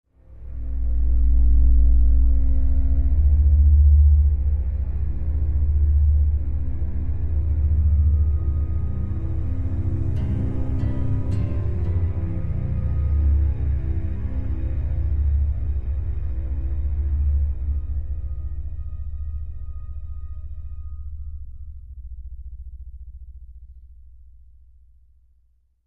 Eerie

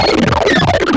hard robot boss; gonner linux macos ps4 switch windows xbox one gamerip 2016

